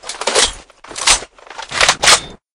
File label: reload, mg; guns